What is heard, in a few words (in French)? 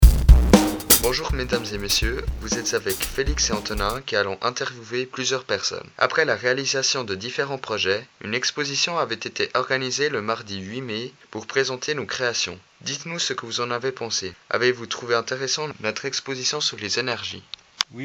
bateau vapeur; lutry pales; school building; interviews